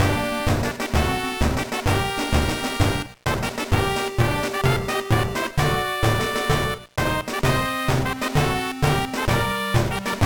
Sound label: Marine Ship Field; one piece going baseball kaizoku yakyuu